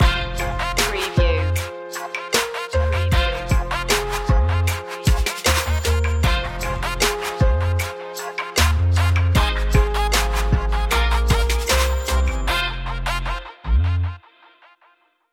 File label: funky trap, rhythmical